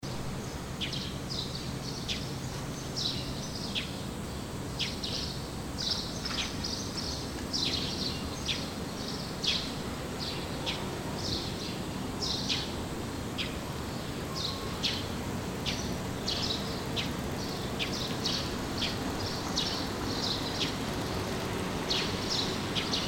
fishing villages

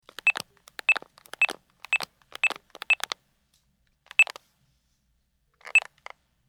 telephone push

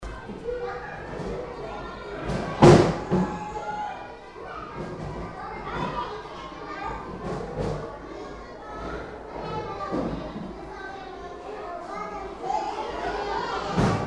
nursery school

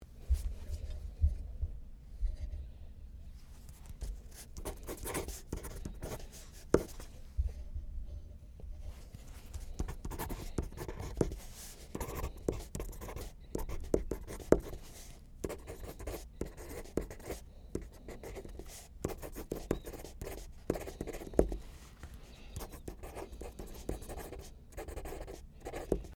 writing

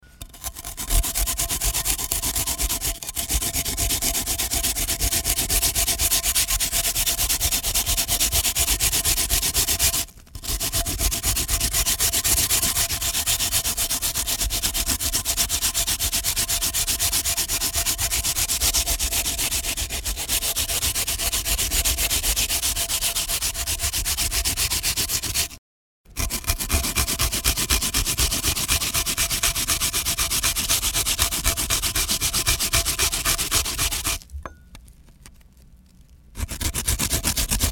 grated daikon

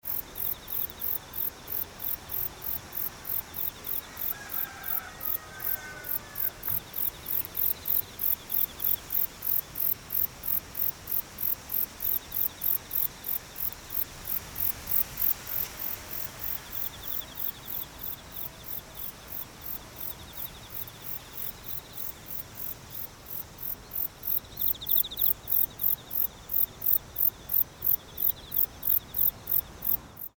singing of insect chiken

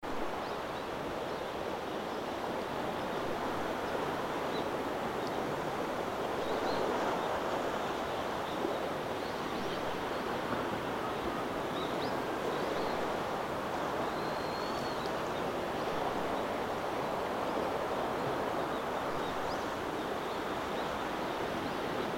wave

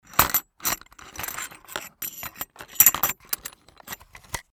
tool box

